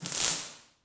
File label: spark